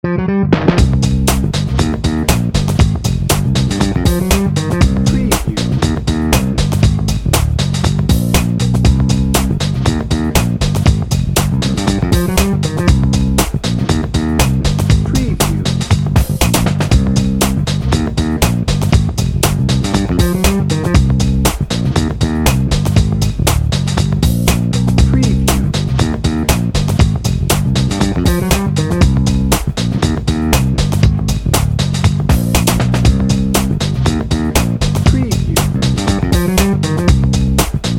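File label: funky junkie drum and bass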